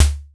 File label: hit heavy; heros